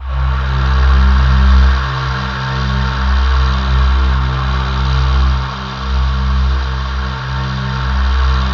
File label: C2, Synth Leads